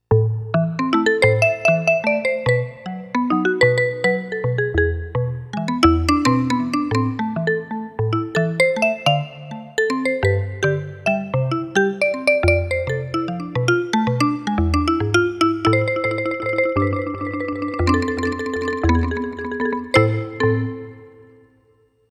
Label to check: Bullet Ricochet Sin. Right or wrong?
Wrong